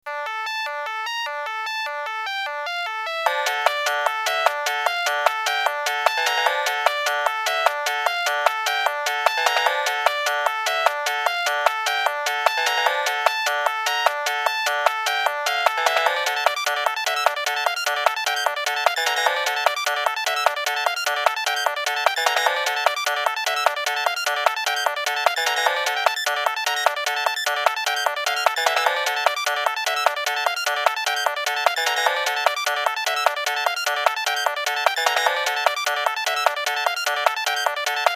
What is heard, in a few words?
Trance